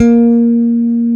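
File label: electric bass finger A; AKAI S6000 CD-ROM - Volume 1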